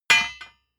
hammer hit steel clank

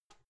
cupboard open

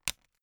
plastic slowly pressured click

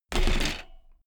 bmx bike land impact rattle

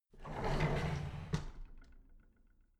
drawer big clatter close regular

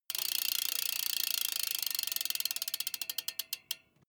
bmx bike freewheel push and slow down short

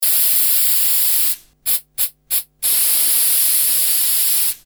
pneumatic gun

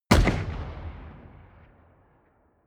shoot distant

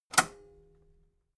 metal lever button radio disable fast

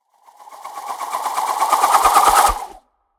whoosh flutter

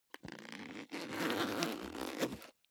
zipper backpack open unstable

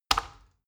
plastic button tiny click heavy fast

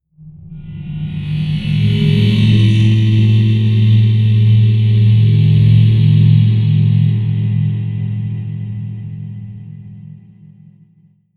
whoosh metallic rumble

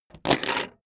poker chip stack big fall down

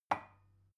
glass put down cupboard soft

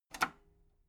metal lever button radio disable slow

